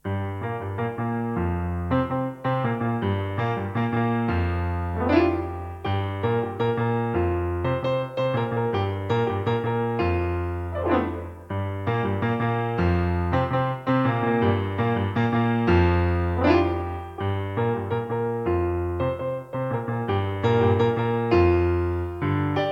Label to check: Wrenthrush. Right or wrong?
Wrong